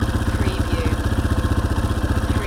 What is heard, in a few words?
Motorcycle Dirt Bike Idle Loop